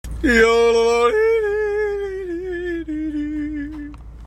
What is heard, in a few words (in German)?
Jodelprobe